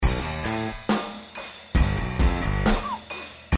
drum bass plus

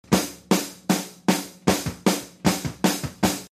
overheads

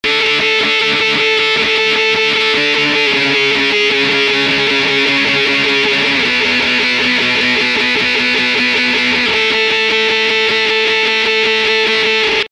chorus guitar

